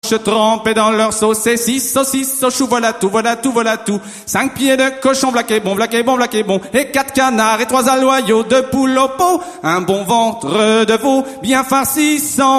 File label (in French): chants de haute bretagne